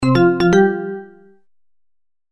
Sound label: broadcast news notification